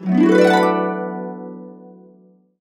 D, Harps; USB Soundscan vol.62; AKAI

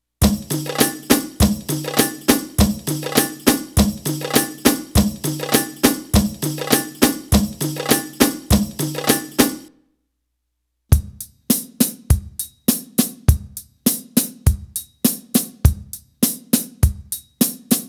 Arabic Rhythms; Hadeeth - Arabic Rhythms Sample CD

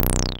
TB C2; Best Service Gigapack 1; SYNTB303

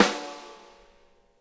RIM, snare; Sonic Reality; Interactive Drum Kits

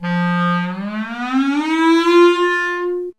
THE CLARINET

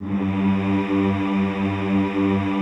G1, Classical Choir; Best Service; Extended Classical Choir

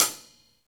Rock Closed Hi Hat, Roland BK-5

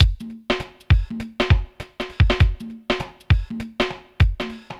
drloop, drumloops, Vinyl Attack; Masterbits

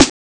drum sharp, Amiga Soundtracker Sample; Amiga Soundtracker Sample Packs

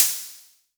Hi Hats Open; LIMAT Div Dept

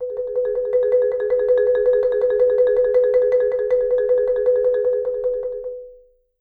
B3, Marimba; roll; Sampleheads - Dave Samuels Marimba & Vibes

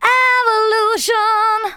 VOCALS SUNG; Techno Trance Essentials

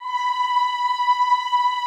OHH B4, Classical Choir; Best Service; Extended Classical Choir

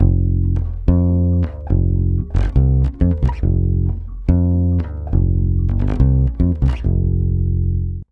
140 BASS F7, Brazil Bossa; USB Soundscan; AKAI

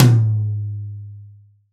TOM, PIN STP TOM

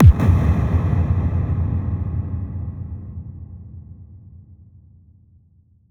hard bass drumz

